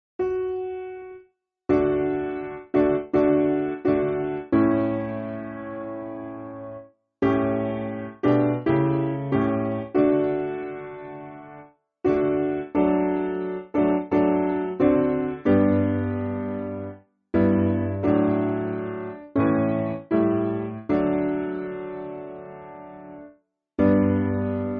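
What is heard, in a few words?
Piano, Music Backing Files for Churches; Hear Our Prayer; Whelpton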